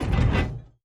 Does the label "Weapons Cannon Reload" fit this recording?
Yes